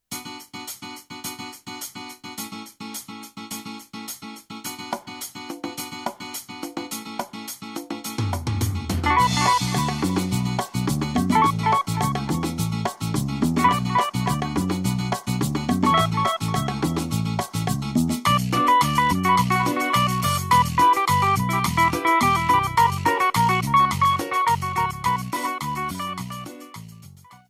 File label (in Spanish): Cumbia; ritmos